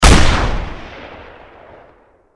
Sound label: anti material rifle fire